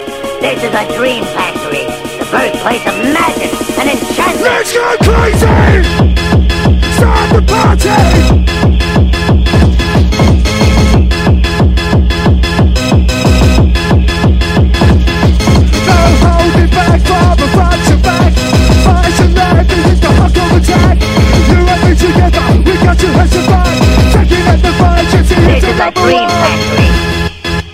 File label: Dj Vibes